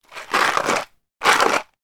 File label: food box of herbal sweets movement